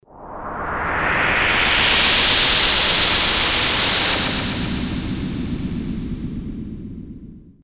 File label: woosh slow